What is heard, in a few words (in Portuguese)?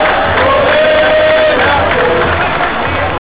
gritos; ole nautico